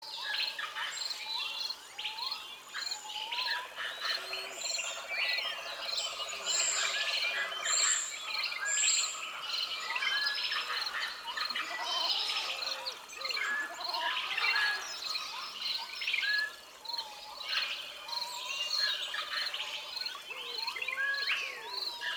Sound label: Animals Nature Sounds Forest Animals Ambience